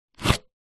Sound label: household air conditioner plastic remote slide out of wall mounted holder; zapsplat